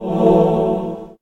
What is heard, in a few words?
magic holy miss; wesnoth